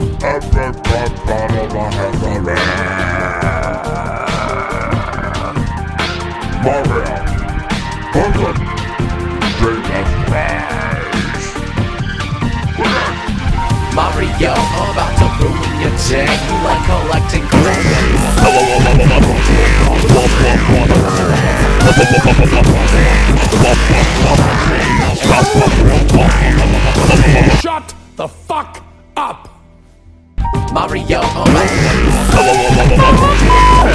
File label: DUBSTEP; SURPRISE DUBSTEP